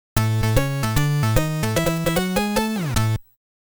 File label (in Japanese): ジングル A; Tantei Jinguji Saburo Inishie no Kioku